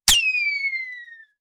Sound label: the sound of the classing, sniper